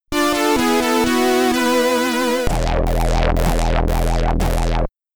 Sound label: Game End (SID Stereo); Double Sphere; Commodore 64